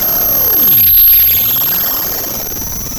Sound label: pop, Sound of a cat meowing